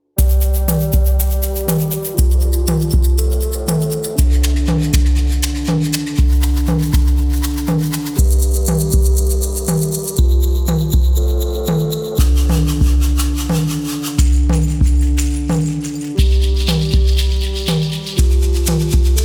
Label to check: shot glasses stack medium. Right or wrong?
Wrong